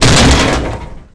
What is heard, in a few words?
hit dumpster; hits; Tony Hawk's Project 8; XBOX